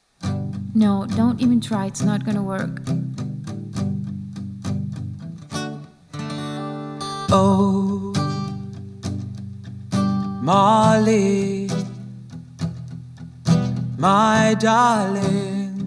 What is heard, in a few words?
vocals; 21st century showcase